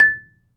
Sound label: MARIMBA; ILIO